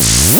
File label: Sweeps Up, Effects